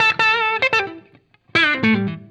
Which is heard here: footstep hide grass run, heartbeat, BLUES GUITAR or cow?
BLUES GUITAR